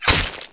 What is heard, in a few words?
knife hit; dod 1.3; dod enhancement pack